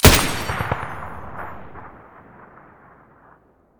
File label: muzzle m249 stereo, weapons